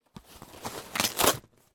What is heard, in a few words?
cardboard sheet rip; foley